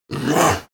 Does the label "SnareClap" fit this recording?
No